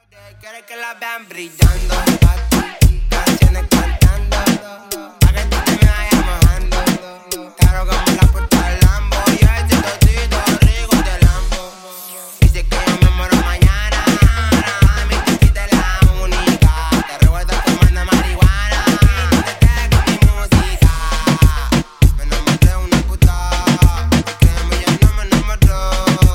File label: Redrum 100Bpm